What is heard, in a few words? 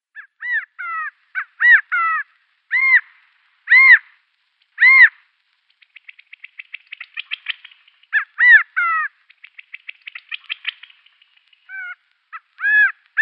California Quail male display